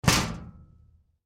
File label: trash metal